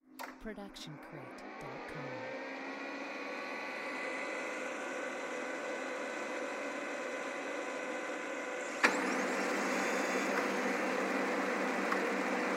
printer starting; soundscrate

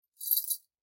Chain Foley; SoundsCrate

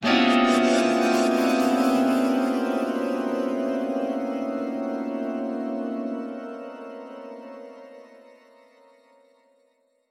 horror haunting piano hit; soundscrate

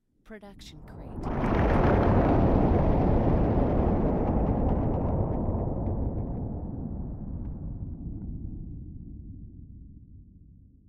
Nuclear Explosion; SoundsCrate

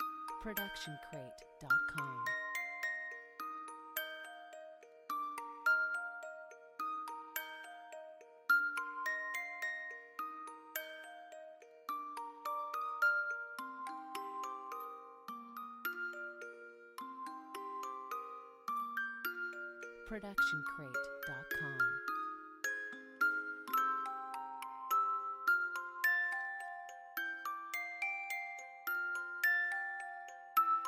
sparkle dance; soundscrate

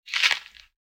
footfall outdoor step; soundscrate

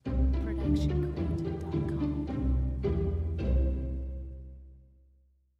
Friendly Ident; SoundsCrate

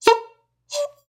Bycicle Horn; SoundsCrate

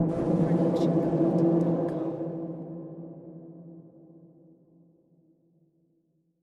sci fi impacts

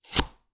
Cutting Board Vegetable Chop; soundscrate

